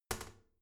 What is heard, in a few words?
toilet lid wall open soft; haus-garten; badezimmer